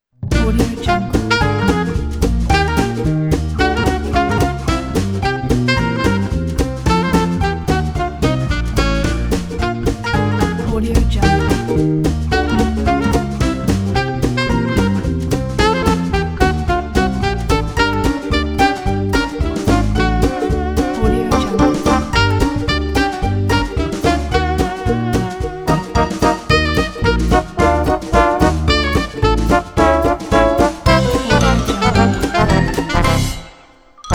funny jazz

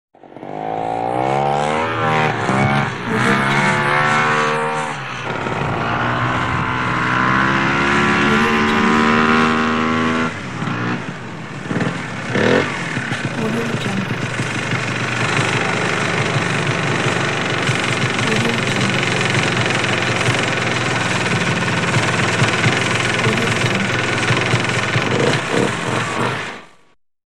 snowmobile

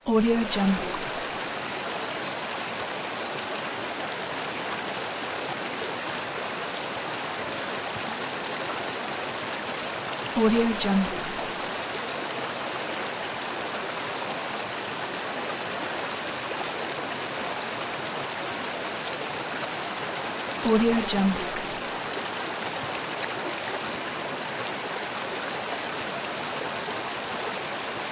river in forest

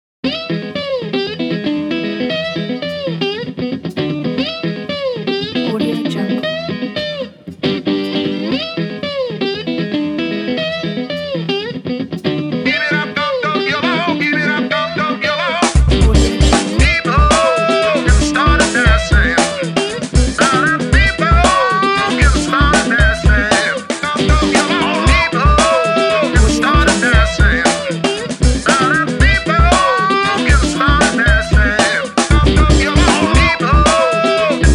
electro blues groovy song; redoctopus

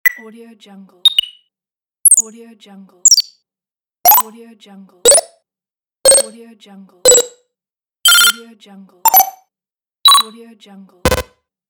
ui interface sounds

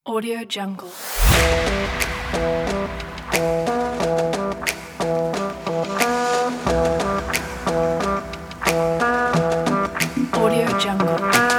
fashion detective jazz

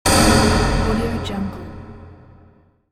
gentle game explosion

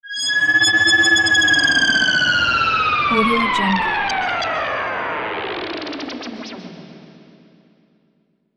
futuristic machine start failure

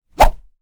gore weap golf club whoosh; soundmorph

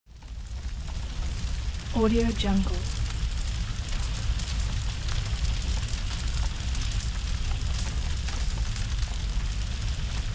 forest fire

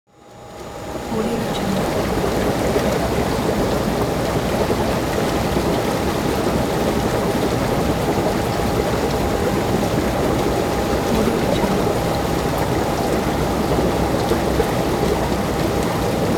jacuzzi bubbles with light motor hum

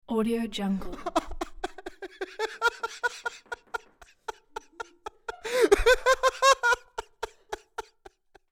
laughing man

